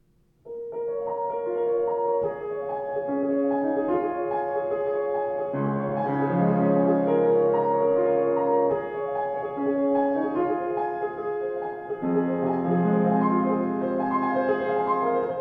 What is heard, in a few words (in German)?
Bechstein Studio, klavier; 115 bechstein studio eiche